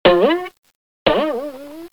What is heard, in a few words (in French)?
Rebondissement, Cartoon; bruitage